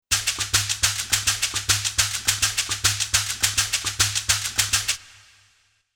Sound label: Sherker Lp, 104; xp-80; roland; 104:Sherker Lp patch demo; roland demo cd-3